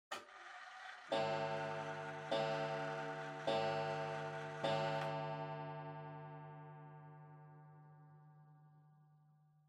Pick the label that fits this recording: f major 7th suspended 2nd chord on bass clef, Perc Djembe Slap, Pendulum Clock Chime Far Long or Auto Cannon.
Pendulum Clock Chime Far Long